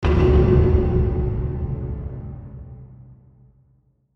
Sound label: Hit Drum Low; Hit Drum Hollow Reverb Long; Blastwave